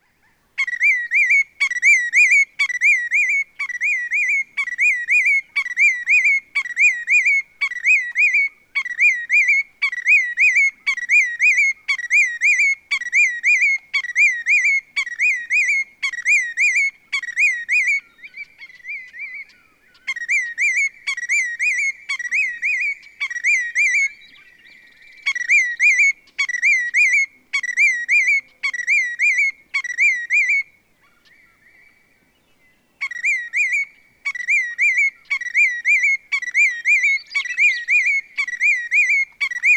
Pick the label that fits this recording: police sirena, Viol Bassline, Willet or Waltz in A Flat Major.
Willet